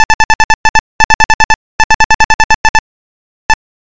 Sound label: Synth Typewriter Multi